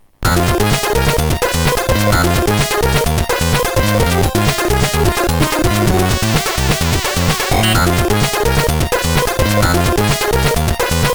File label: Carillon, gameboy